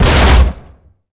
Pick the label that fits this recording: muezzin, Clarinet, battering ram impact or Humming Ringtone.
battering ram impact